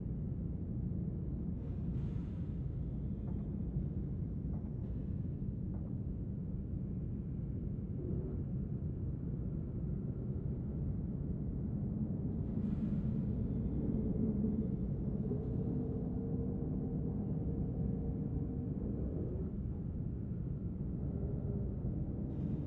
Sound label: Ambiance Siberian Interior